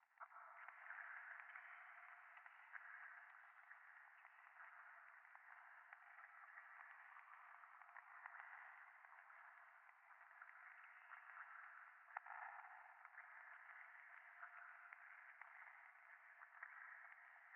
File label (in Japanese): ゴースト フェラチオ 暖炉